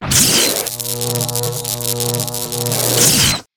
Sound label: thunderfield